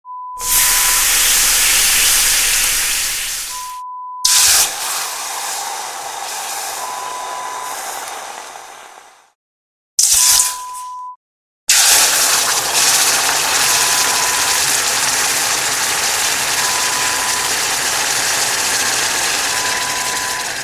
sizzling bubbling sounds

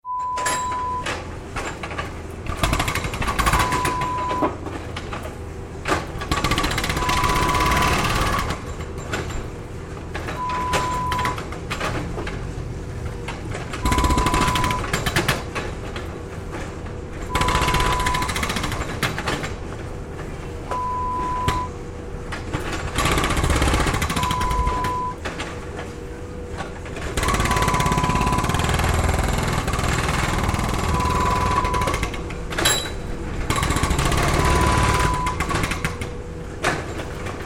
Road Work sounds

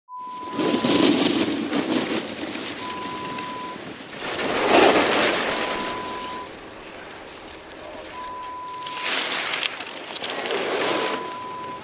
Snowboarding

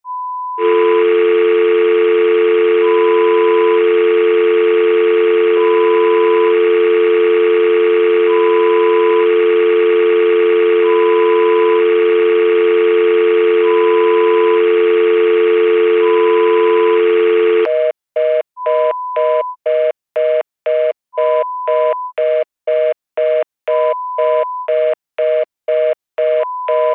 Dial tone sound

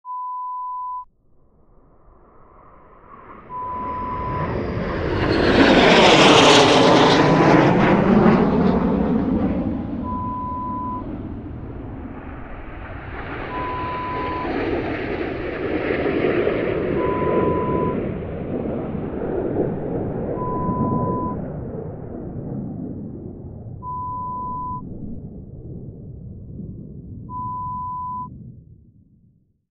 Fighter Jet sound

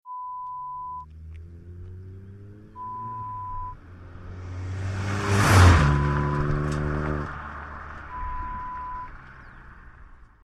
Car Drive by

